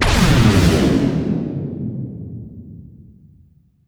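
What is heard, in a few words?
DEPTH CHARGE, WARHEADS; Spectrasonic Distorted Reality 1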